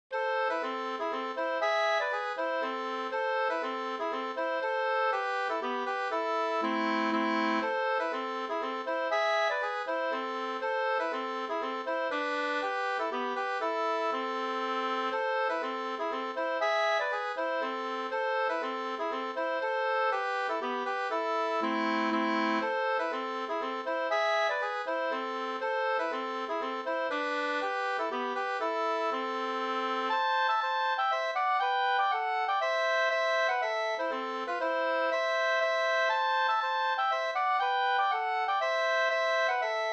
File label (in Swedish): polska Slangpolska